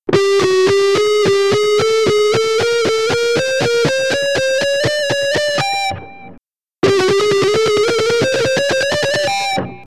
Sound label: C blues, licks